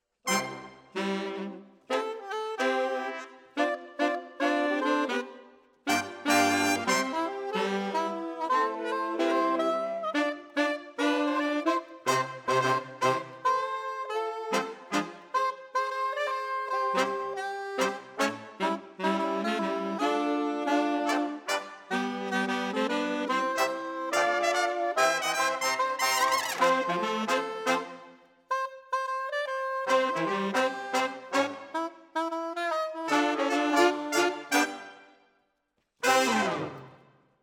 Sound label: Sax